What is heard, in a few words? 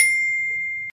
Alert Ding